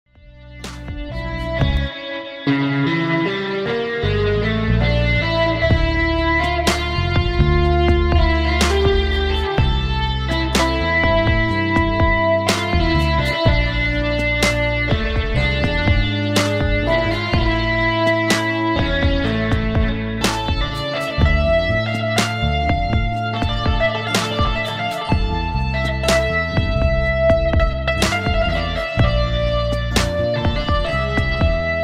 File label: Guitar